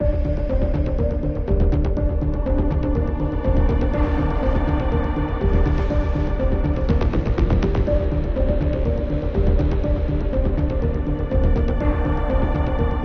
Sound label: Creepy; dramatic